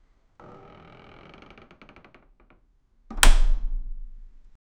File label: a crowbar prying opening, creaks